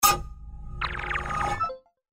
FX CARTES

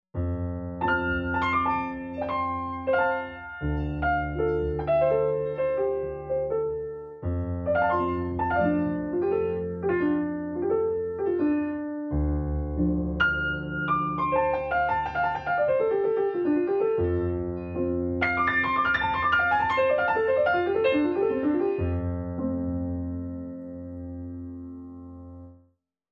HABILLAGE PIANO

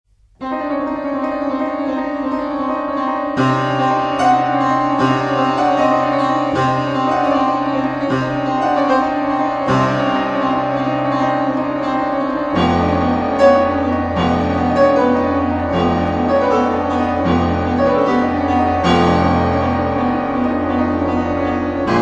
PRELUDE EN DO MINEUR

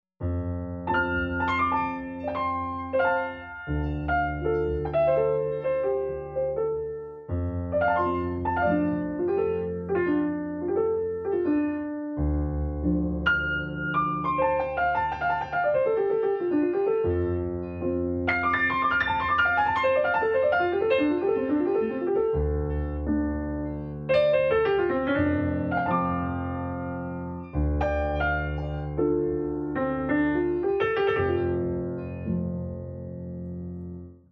FIORITURES PIANO

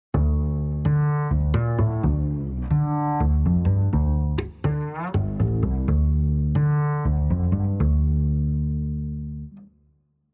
BASSE VAHINE

